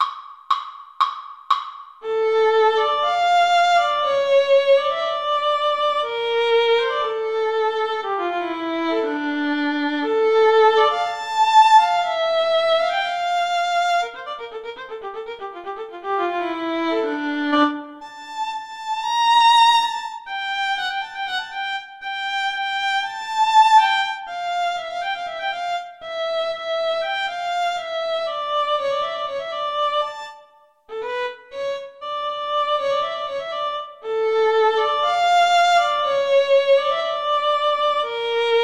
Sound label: Violin Cello Duet; brahms hungarian dance 5